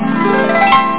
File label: harp; dragon